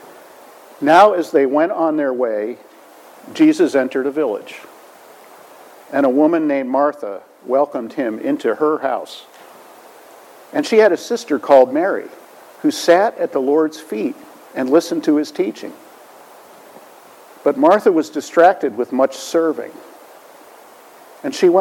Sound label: Gospel